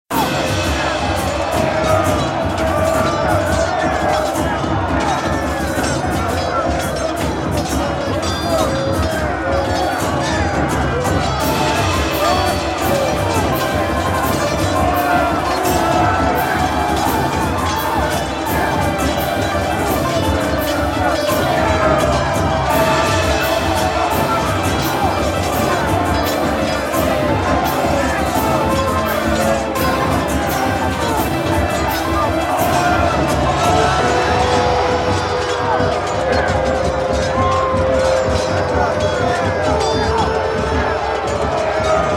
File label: oe battle w sfx; Oriental Empires